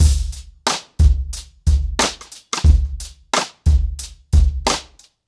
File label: 90BPM, ACOUSTIC DRUMS